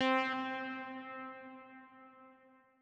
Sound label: lead; bespoke - Floral v.1